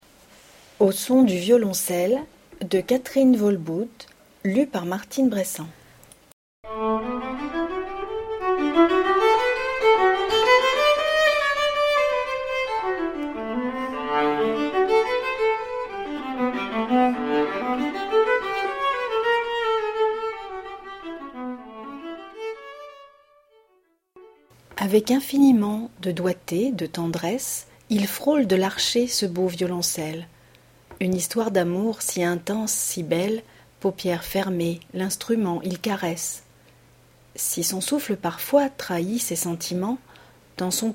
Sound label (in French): violoncelle; poemes